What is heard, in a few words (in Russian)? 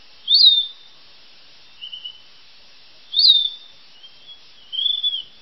oglushitelnij svist muholovki